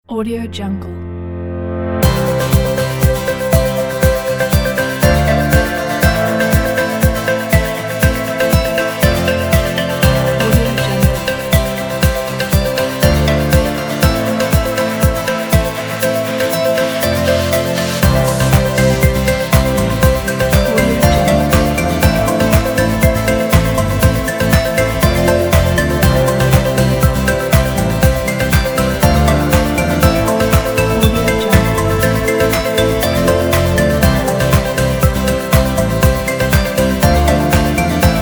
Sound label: upbeat uplifting corporate